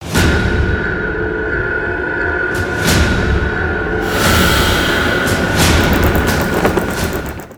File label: vampire fly out; Vampires bed